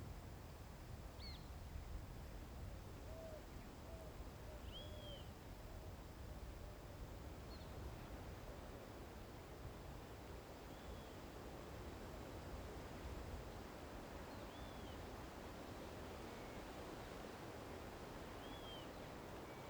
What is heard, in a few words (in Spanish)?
AMB EXT TARDE CAMPO Tacuarembo UY Generico; Est M Escayola